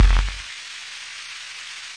dirty exploded snare; single hits

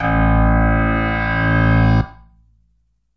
Moo Piano A1, moo backwards piano

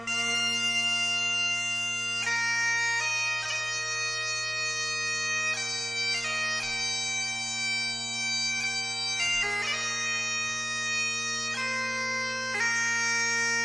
bagpipes british tribute; WORLD

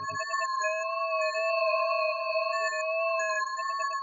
phasing bendy bells, looping ambience

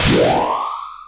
Electro FX; Ueb HE Electro FX

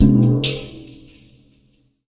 wavedrum

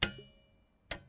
metallics, Typewriter Kit

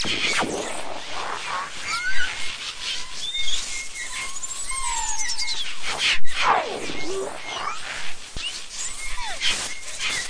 robot cockatoo, Electro and Synthetic

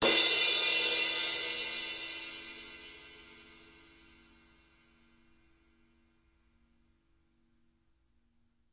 Crash, DrumKit; REAL LIVE KITS; Osama Qureshi DrumKit

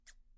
Scissors Shears; Scissors Kit

